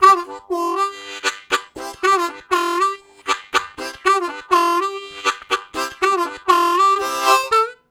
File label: Harmonica, WAV Riffs; Zero G